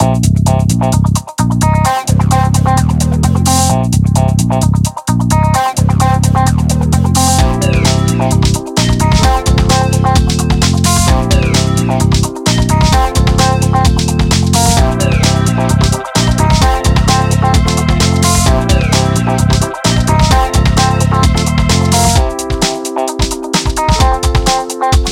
music; eGravity; Speedy Boat

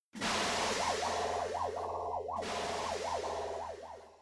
caught by droid; star-wars-rebels-team-tactis; drone

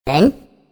click; monstergo; pokebot

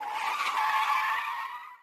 car skid short; lightning speed

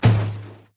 object hit plastic drum; lightning speed

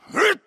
jump; star wars rebels team tactis

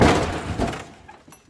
car crash rear; lightning speed

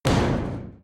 crash noise; star-wars-rebels-team-tactis

